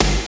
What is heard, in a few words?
snare bass; Roland L-CDX-01; Snares 6; Sn Modules 6